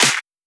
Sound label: Snare Clap Snap Combo